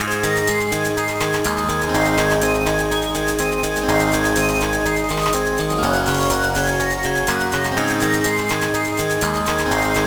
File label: Song Menu; Mermaid Melody Pichi Pichi Pitch; GBA